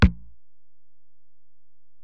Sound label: Ac BD, Alesis DM-PRO; 300 Drum Machines